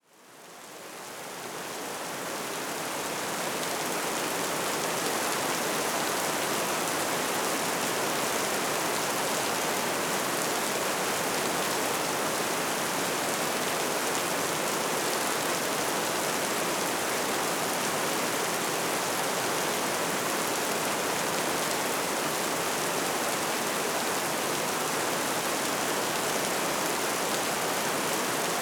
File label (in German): Regen in der Natur